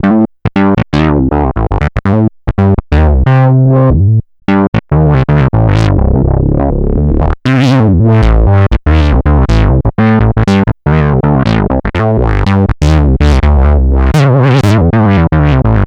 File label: Synth loop 121 Am, Synth Bass Loops; Slate Digital BASSHOLE Sample Pack